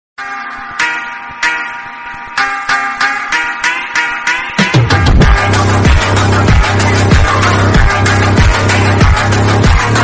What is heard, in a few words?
phonk trollge bgm background music